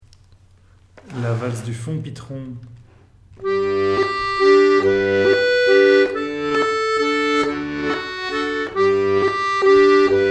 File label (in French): Valse, accordeon; Valse du Fond Pitron